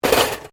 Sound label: Cutlery general drop drawer, Household cutlery; Kitchen